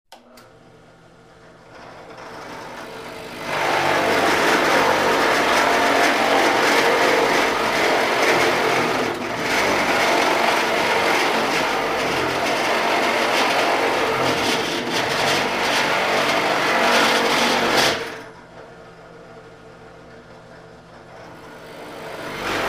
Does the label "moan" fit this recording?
No